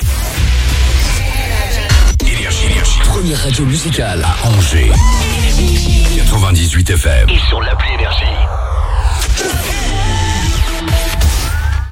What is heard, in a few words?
JINGLE